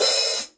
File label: 14 hi-hat open; paiste 14 giant beat hi-hat; paiste cymbals